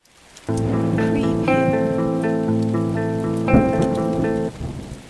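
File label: Arpeggio 4-4 Am Clean 120BPM, electric guitar; beatcraftpro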